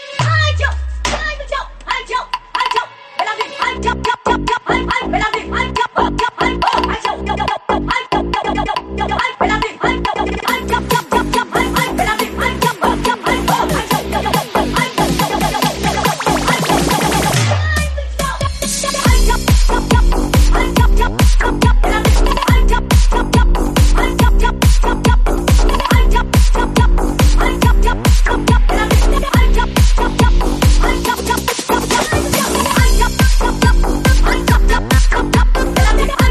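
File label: ringtone remix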